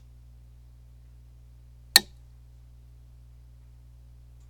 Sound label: Claw Hitting Glass